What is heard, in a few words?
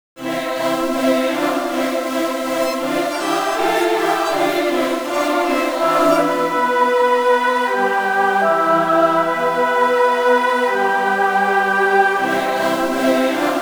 full chorus